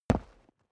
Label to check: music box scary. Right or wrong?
Wrong